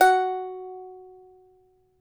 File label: CHARANGO F#3; Spectrasonics; Hans Zimmer Guitars VOL-2